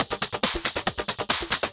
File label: Nightfly Kit 138BPM Loop, Drums & Perc; Vengeance Samples; D minor